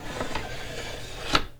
Door Display Case Slide Open; Doors

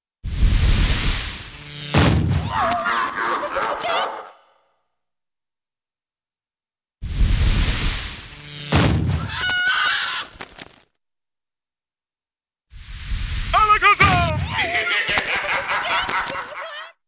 Short Electrical Sizzle Roaring, Cartoon; Hollywood Edge; Cartoon Trax

